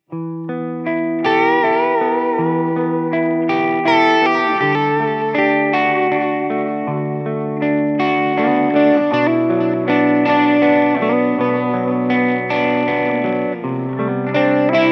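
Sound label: delay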